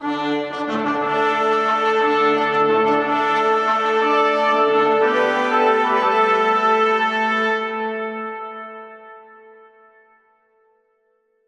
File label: Fanfare